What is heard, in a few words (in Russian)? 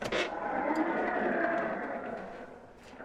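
медленный и плотный звук открывающейся двери из за этого он кажется механическим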